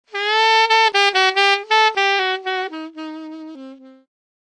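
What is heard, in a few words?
090 bpm breath jazz sax; HORN LOOPS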